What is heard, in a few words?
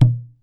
CALF HIDE, AFRICAN PERC; AKAI S6000 CD-ROM - WorldSounds